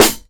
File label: dry snare sound a sharp key